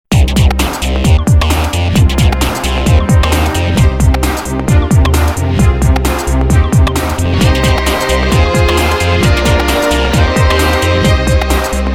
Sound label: Tech Symphonic